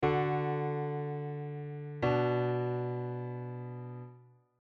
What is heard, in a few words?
Passing Tones